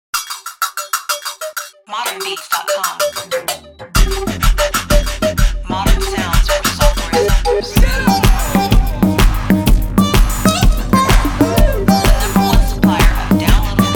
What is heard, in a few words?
Ethnic Music Chopz